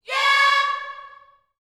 "yeah", gospel vocal, C#5; Spectrasonics; Vocal Planet Vol.1 Gospel; akai

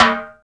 TOM; E-Lab; X Static Goldmine 1

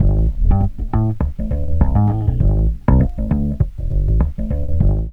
FUNK BS; E-Lab; X Static Goldmine 2; akai

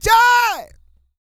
SING; Spectrasonics; Vocal Planet Vol.1 Gospel; akai

